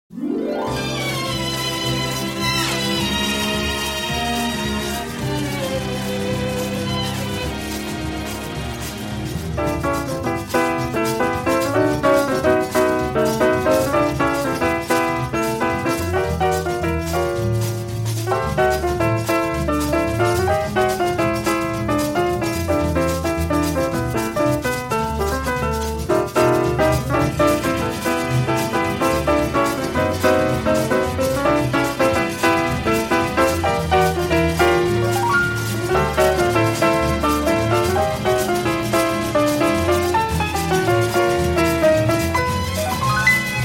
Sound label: Piano, Strings and Bossa Nova